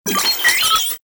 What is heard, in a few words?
Sniper Drone Vocal; Lasrian Drones